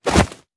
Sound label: melee attack fist; viper